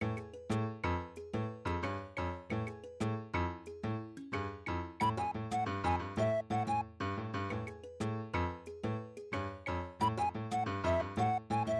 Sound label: MIDI BGM Africa